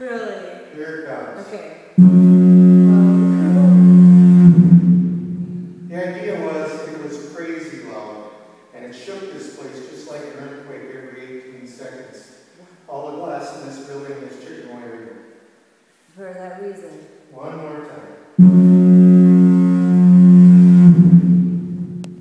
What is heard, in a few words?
split rock lighthouse fog horn